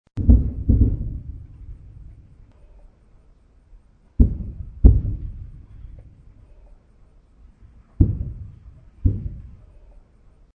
Distant Artillery Multiple, Distant artillery blasts; Cannon Sound Effects; Sound Effects:War